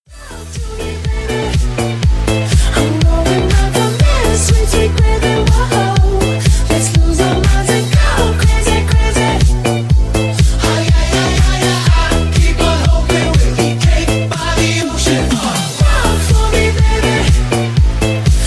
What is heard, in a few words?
Funky House Mix